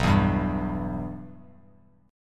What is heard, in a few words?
B Suspended 4th Sharp 5th B Bass Standard; Bsus4#5 strummed; JGuitar